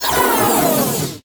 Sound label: Menu Powerdown; Menu FX